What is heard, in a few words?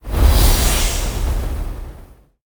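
Pillar red attack, monster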